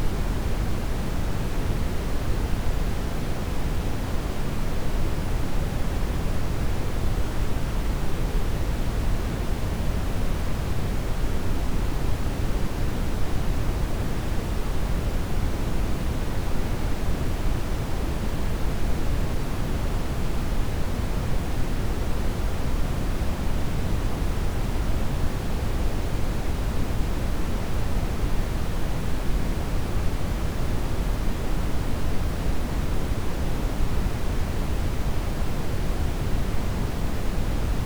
Brownian Noise STEREO -23 LUFS non-coherent L&R 48kHz; Fair loudness-based measures for live events